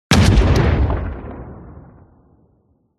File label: Weapons Artillery Light Close